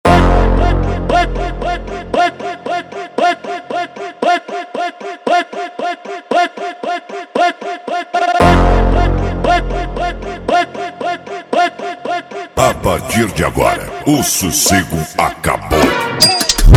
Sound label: funk